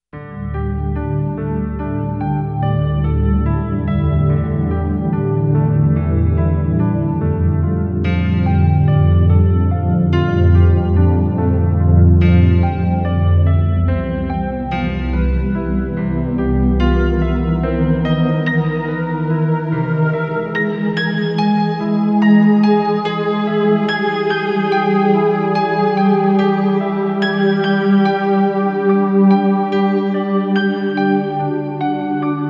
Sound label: Healing Pad